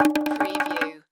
Bongo Scamper; sound-effects-bible

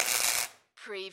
Arc Welder Short Burst

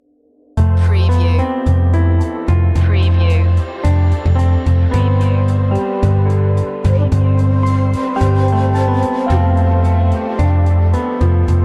on hold

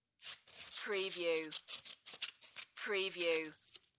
scissors multiple snips

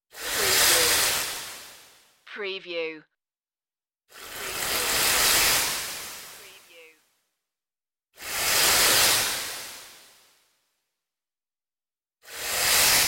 witch disappearance swooshes

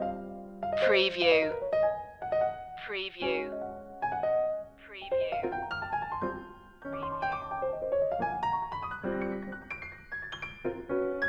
jazz fusion loop

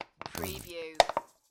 Squeezy Honey Bottle; beanstalk audio

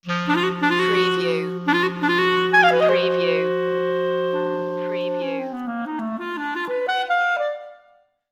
clarinet conclave sting

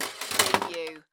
Pinball sound, ball roll and passing object

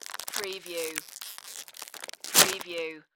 Potato Chips Bag Open

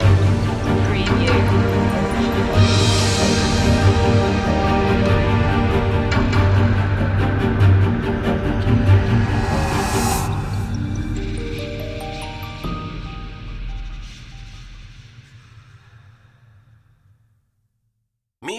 magical winds stinger

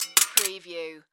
Sword Set Down Cutlass

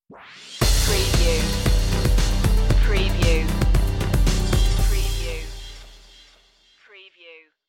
new dawn stinger

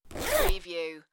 Zipper

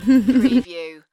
Lady Giggle

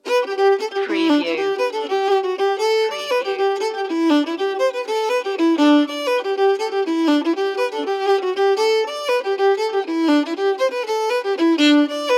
celtic fiddle the swaggering jig drops of brandy

